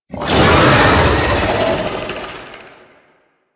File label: Tank Infested Vocal Attack Swipe; Enemies; Barks; Warframe; Lasrian